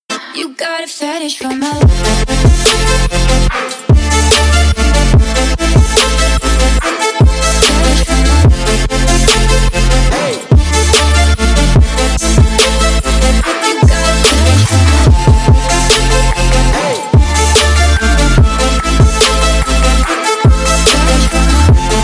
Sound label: trap